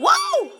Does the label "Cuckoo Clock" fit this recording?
No